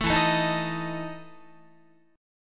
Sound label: E Major 7th Suspended 4th E down Guitar; EM7sus4 strummed